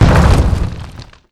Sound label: stone form; kolmods proj impact